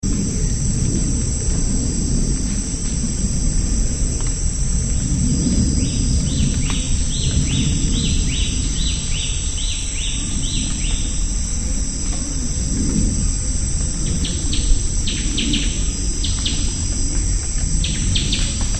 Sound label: golden cuckooshrike